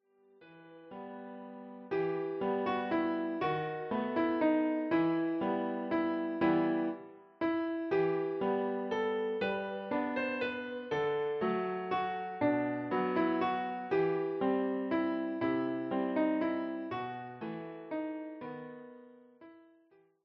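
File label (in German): Klavier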